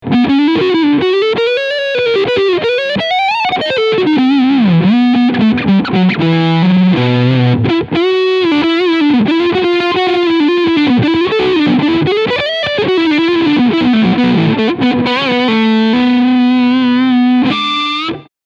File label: Leadsound Front PU